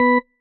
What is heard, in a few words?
marimba tissue; ゆめ2っき